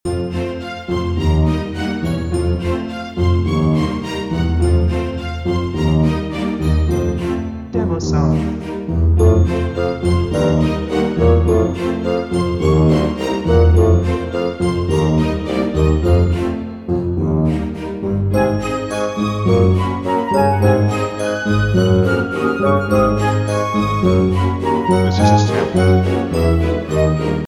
Loop

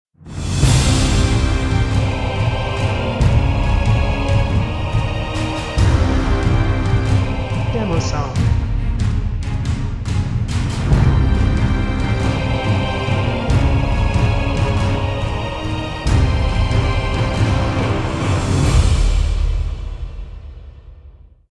Age of Darkness Intro with Choir

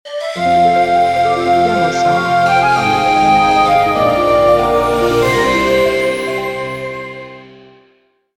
Magic Soft Bright Intro or Happy Ending

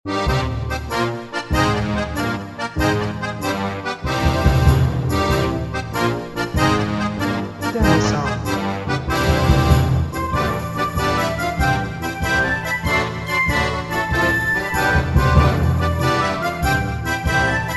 Drunken Sailors Tavern Loop

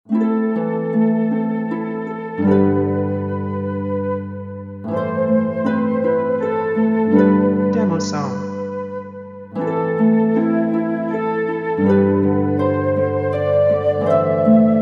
Solo Harp and Flute

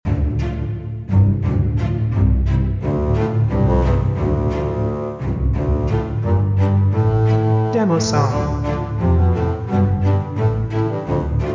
Loop

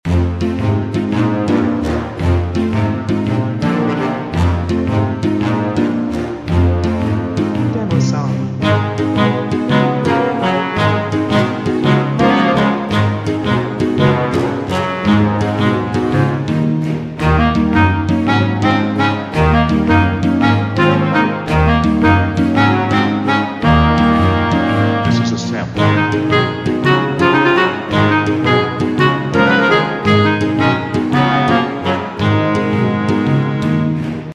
Lively Playful Mood Loop Brass Clarinet

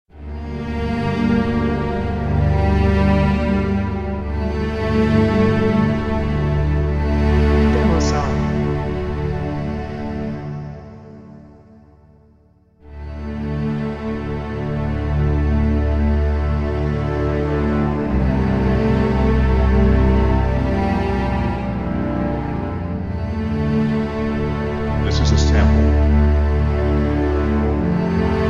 Emotional Moments, Strings

